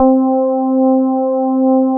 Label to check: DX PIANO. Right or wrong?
Right